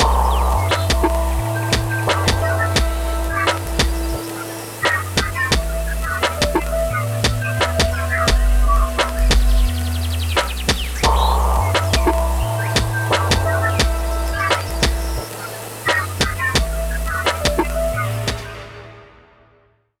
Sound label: Rajasthan Raga Rhythms; yuttari